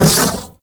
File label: Flame Laser Stop; Warframe; Corpus; Corpus Railjack; Spec Ops Fire Moa